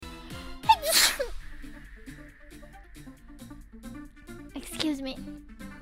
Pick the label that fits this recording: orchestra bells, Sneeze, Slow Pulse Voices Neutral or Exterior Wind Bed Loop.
Sneeze